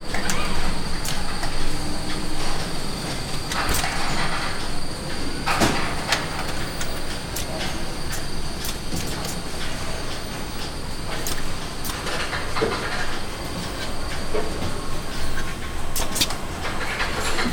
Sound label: Medium Distance - Machine, Clicking, Air Compressor; Double Trouble Audio